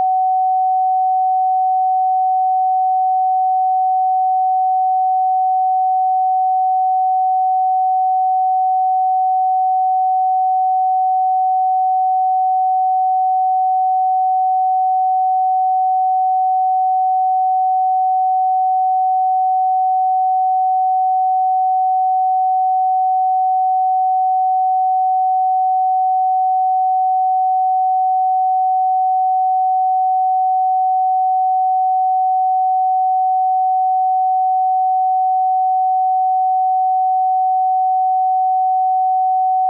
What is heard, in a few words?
750hz sine base; new sine tests